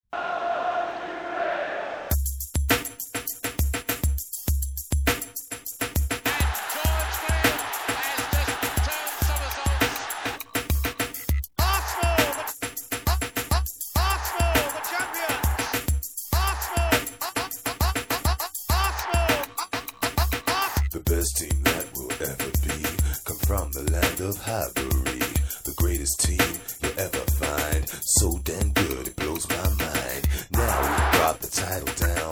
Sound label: Rap; Arsenal FC